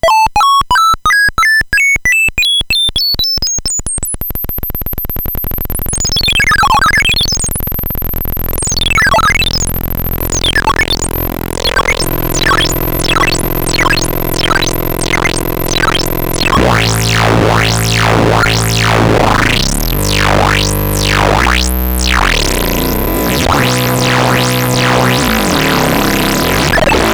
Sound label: SN76489 FX1 TONE CH1